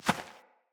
Fawn Step; Encounters; Animal Rescue

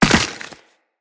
Land Soft Ice, Footsteps; Characters; Operator

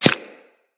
Arrow Impact Flesh; Weapons; Bullet Impacts

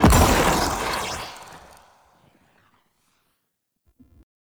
Laser Impact; Enemy; Characters; Abilities; Mendicant; Mnd King

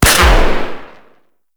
Sentient Pistol Shot; Warframe; Amalg

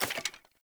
Pickup Wood; Resource Materials

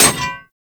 Melee Axe Impact Generic; Axes

